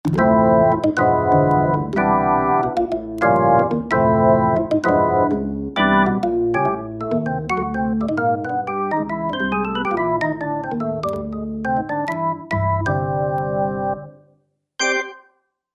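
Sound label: Tone Wheel Percussion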